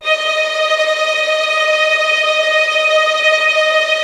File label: TREM D#5, Violin; Q Up Arts; Denny Jaeger Master Violin Library; PERFORMANCE